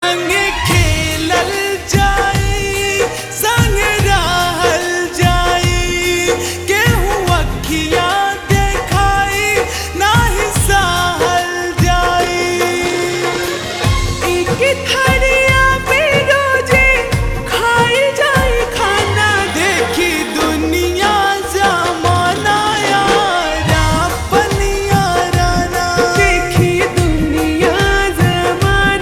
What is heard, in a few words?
Ringtone Slowed Reverb